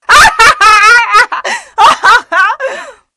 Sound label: cheer